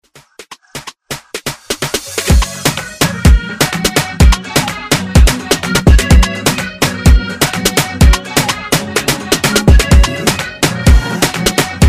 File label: Afrobeat Redrum